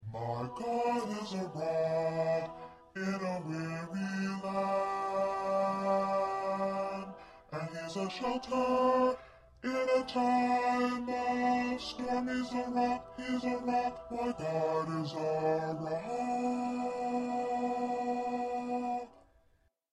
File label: 1st Bass High, choir parts